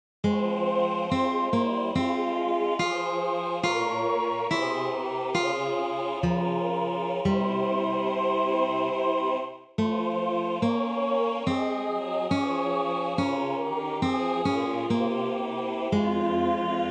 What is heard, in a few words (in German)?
Aus tiefer Not chor ténor